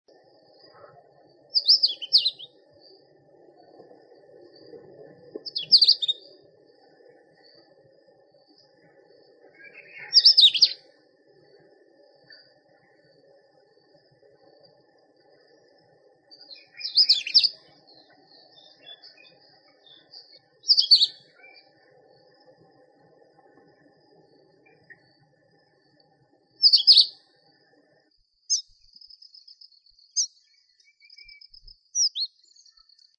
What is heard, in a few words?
indian robin